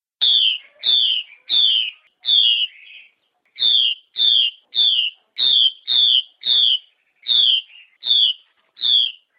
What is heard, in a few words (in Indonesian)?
suara hwamei